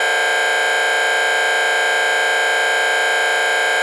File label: US Airhorn; Tonfolgen Hella; Dachbalkensystem RTK 7 Konfigurator